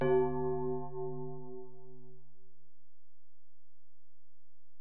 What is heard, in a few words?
Bell, Alesis DM-PRO; 300 Drum Machines